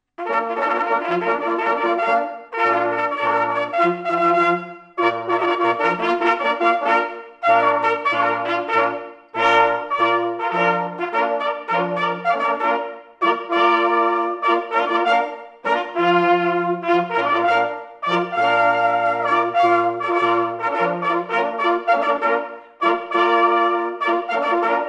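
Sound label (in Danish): tutti, jagthorn; fm 2015 kærsgård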